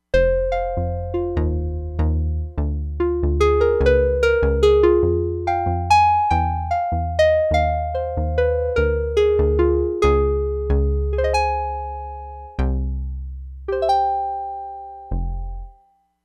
SQUARE PIANO BASS - HARP, Crumar Bit 99; Factory Programs

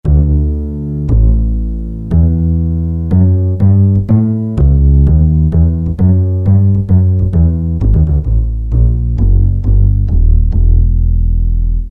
Acoustic Bass, Roland PN-D50-02

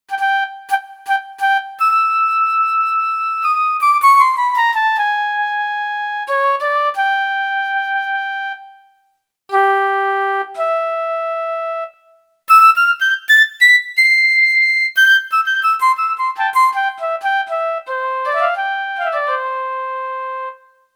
Flute Piccolo, Roland JV-880; Factory Patches

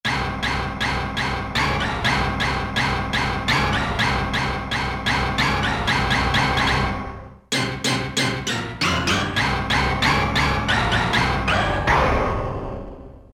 ORCHESTRA HIT, Yamaha PSR-300; Voices

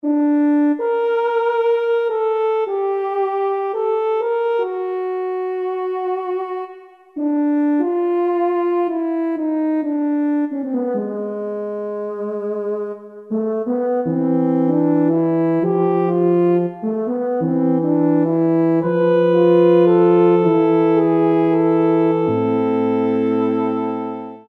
Yamaha TG33

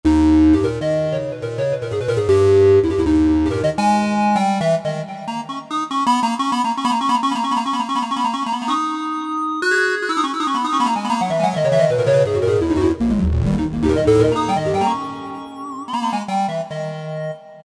Scamp Stabs; Generalmusic S2R

